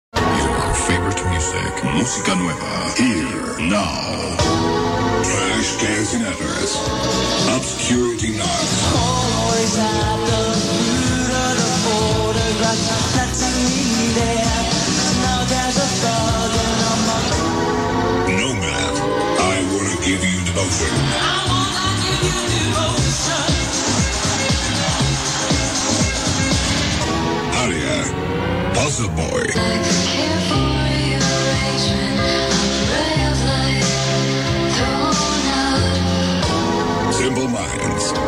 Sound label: New music, radio